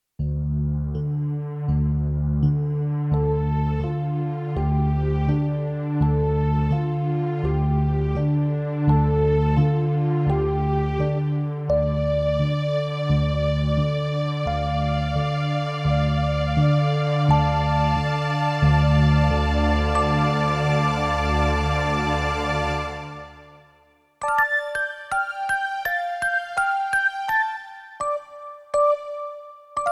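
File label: prelude D minor sus4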